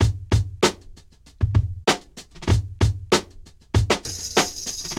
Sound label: Jagged Glass Drums; Ableton; Breakbeats by KutMasta Kurt